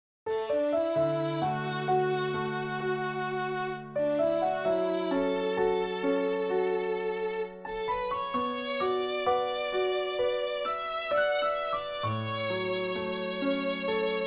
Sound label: piano violin